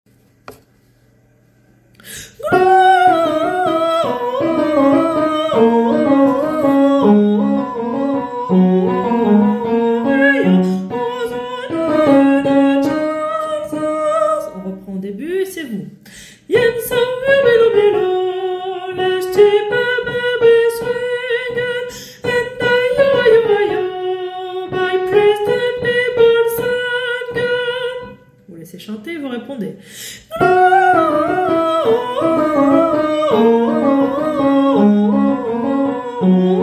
partie tenor; Ding dong

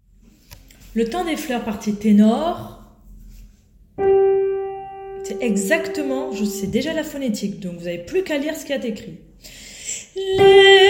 partie tenor

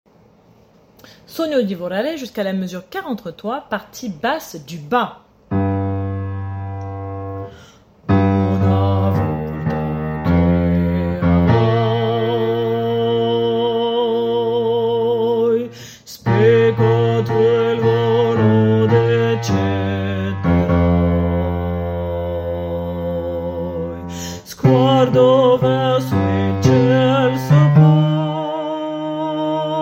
Basse du bas

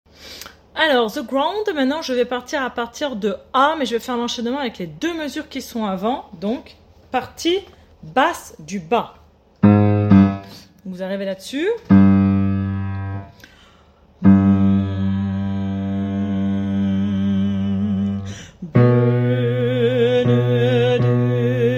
basse du bas

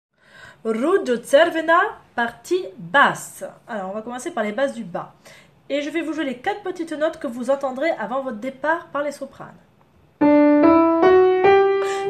basse bas; Ružo cvrena

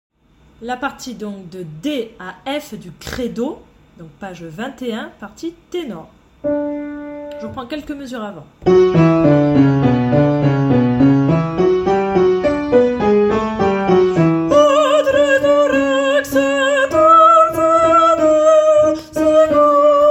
partie tenor; D à f credo

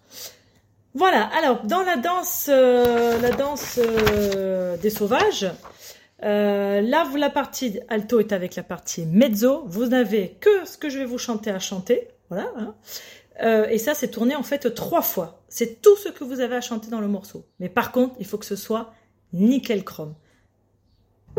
Alto et Mezzo

